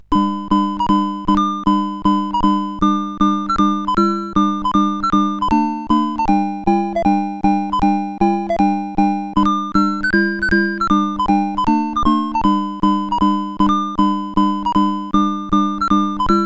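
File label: Maxi Golf, MOS 8580 SID chip music, games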